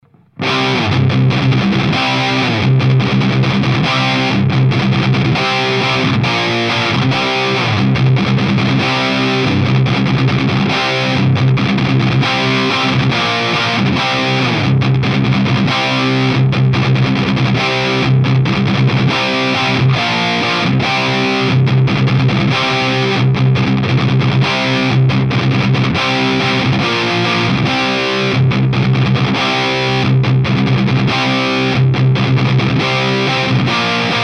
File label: FAME T120H OD, guitars